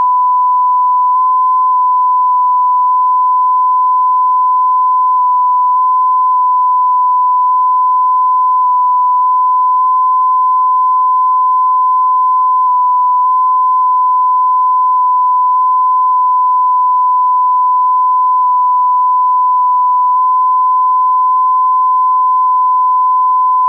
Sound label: JT9A; from WSJT-X with default 1500Hz tone setting